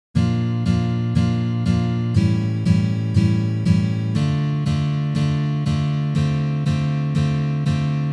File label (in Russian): Uprazhnenie s akkordom A7